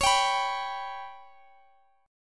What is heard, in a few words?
B Suspended 2nd Sharp 5th